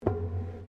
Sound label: Footsteps Boots Metal Spiral Stairs Steps; Footstep Foley; Human